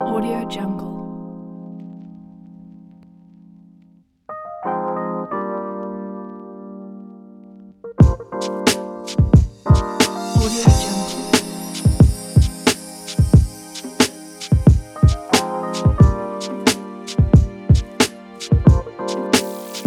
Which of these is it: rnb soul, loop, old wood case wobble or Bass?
rnb soul